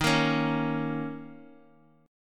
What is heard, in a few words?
Bb Minor Double Flat 5th Eb